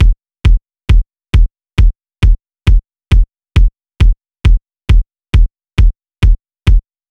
Kick

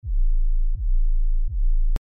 ENV

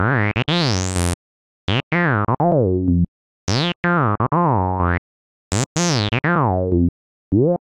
Glide Mode

